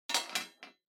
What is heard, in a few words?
Bingo Machine Drop